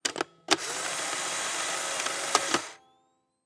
polaroid camera take picture; mechanical

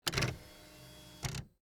typewriter return

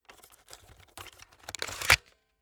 polaroid camera film cartridge pull out; mechanical

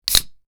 can open; drinks

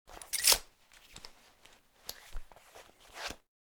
belt, cloth

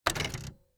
typewriter line break

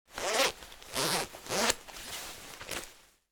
jacket zipper

